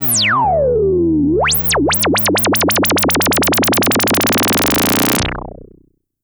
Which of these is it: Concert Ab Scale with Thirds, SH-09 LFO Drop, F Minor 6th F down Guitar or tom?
SH-09 LFO Drop